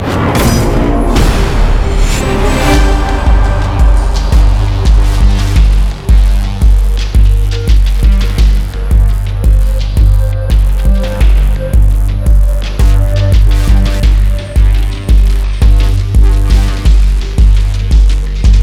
Multiplayer Match Win; modern combat 5 blackout; android

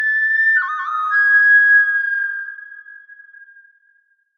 Hunt Signal; Assassin's Creed III

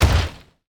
Mass Destruction Hit; Android; Portal Quest

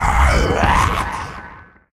Daemon rage; Puzzle Chronicles; PS3; PSP; X360; SFX SPELLS

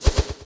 windup; En cooke windup; Fight Knight

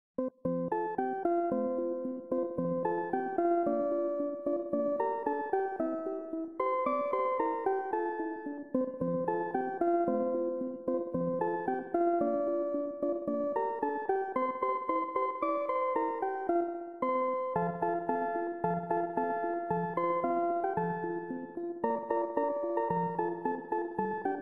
Jingle Bells; Super Kitiku Mario; Brutal Mario

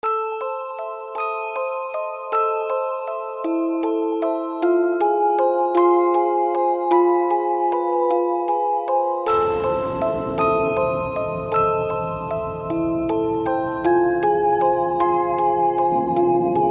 dungeon windmill; Aveyond 4 Shadow of the Mist; RPG Maker

